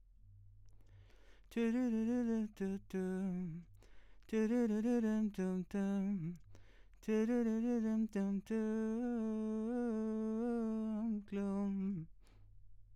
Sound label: VOX